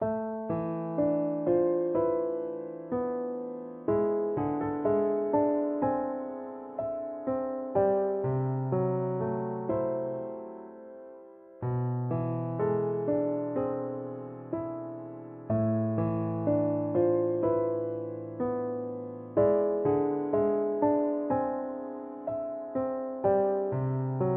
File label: melancholy piano bgm